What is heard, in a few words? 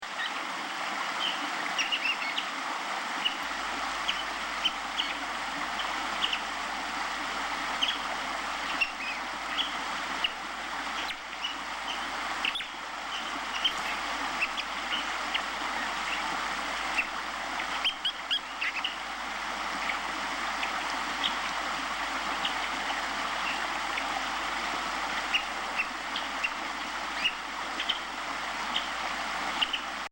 Odorrana Amamiensis Is Calling